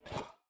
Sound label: step, copper golem, oxidized; Minecraft